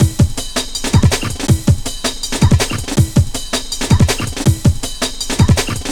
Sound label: 162, Jungle Breaks; Jungle Warfare 1&2